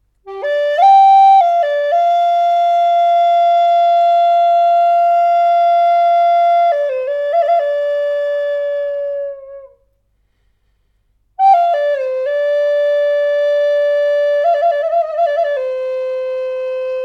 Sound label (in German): Flöte NAF art Stimmung G 440 Hz